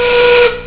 train horn; Autosputnik

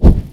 fire light; OneShot Fading Memory

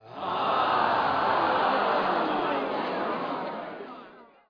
crowd birdie lose

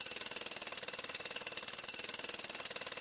iron canon

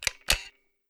take lens out; OneShot Fading Memory

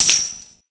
money pile break

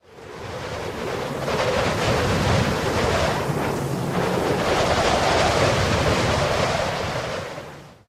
high altitude; OneShot Fading Memory

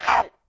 bird collision low

